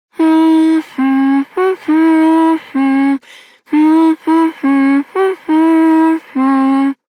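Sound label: Child Rhymes Hum, Child Male; Duviri; Childrens Rhymes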